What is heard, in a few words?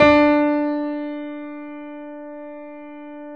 D 3, Keyboards Acoustic, GRANDP ST; USB Soundscan vol.17